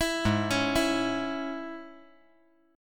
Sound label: G sharp Suspended 4th Sharp 5th, G sharp, down; G#sus4#5; arpeggiated; JGuitar